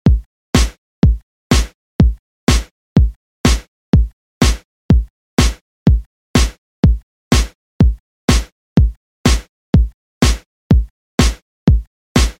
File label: 80s Redrum 124 Bpm